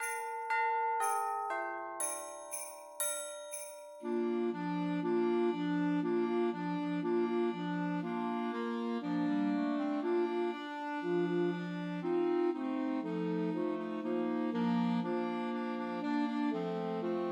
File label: Jingle Bells Trios for Two Clarinets and Alto Saxophone; Christmas